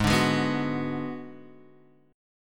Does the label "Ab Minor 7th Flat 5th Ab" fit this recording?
Yes